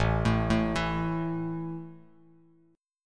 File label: A 5th A down Bass Standard; arpeggiated